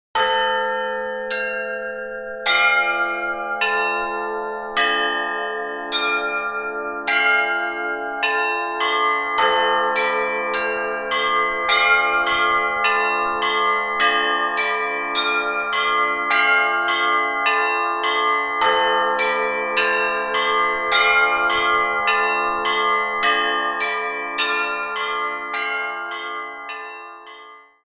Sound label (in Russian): zvon